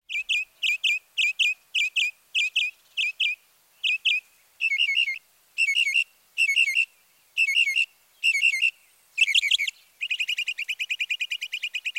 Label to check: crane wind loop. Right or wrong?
Wrong